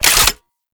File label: Exalted Sniper Reload; Warframe; Frumentarius